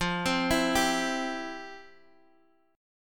F Major 7th Suspended 2nd F, Guitar Half Step Down; FM7sus2